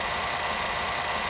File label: empty; lazerinis; cstrike